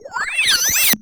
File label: Roland C Noise, Roland S100; musicradar-synth-samples